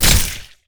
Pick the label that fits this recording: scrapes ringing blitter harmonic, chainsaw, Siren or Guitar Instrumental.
chainsaw